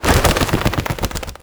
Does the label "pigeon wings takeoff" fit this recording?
Yes